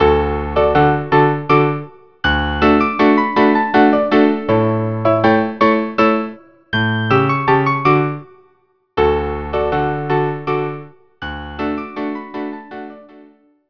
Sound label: trio